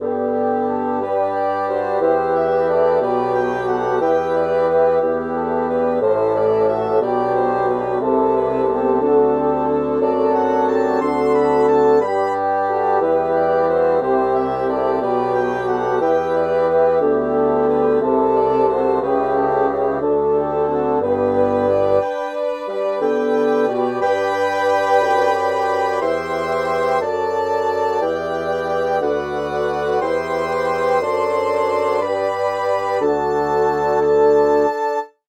bassoon

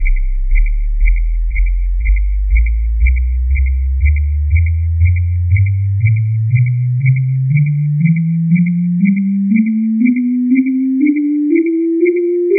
whistle tonleiter

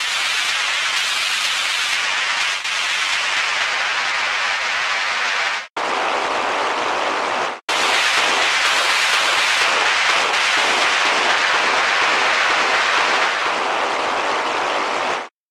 applause

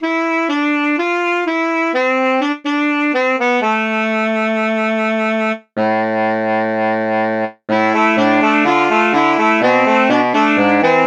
alto sax

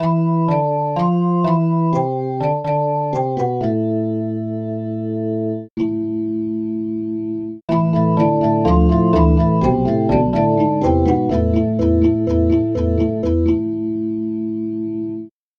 percussive organ

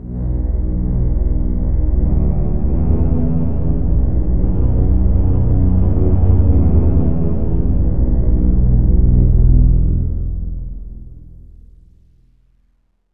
soundtrack bass

